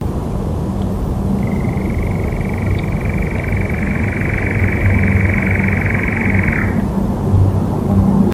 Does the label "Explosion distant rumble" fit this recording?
No